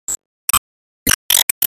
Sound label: Home Stadium beat Intro; disney star smash android ios mobile gamerip 2020; Tale